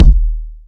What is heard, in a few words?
smooth bottom end kickdrum D# key; tuned drums d sharp key